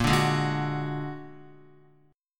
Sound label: Bb Augmented 7th Bb down Guitar 4E,4A,5D,5G,5C,6F; Bb+7; strummed; JGuitar